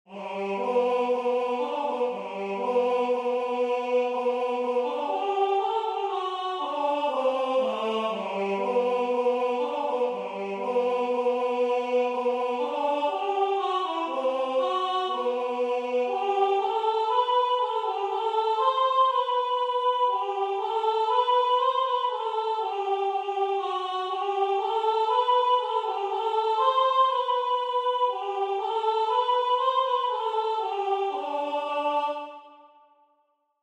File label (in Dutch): t Is geboren, Alt